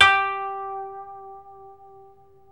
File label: KOTO MILD